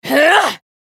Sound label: spell cast effort; Shyvana; en US